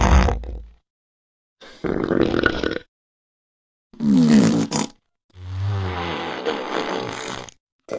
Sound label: toilet